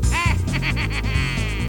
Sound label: laugh; Wario's Laugh from Mario Kart 64